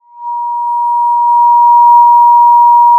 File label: Sound of a police car siren